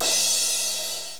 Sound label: crash; sunrisechat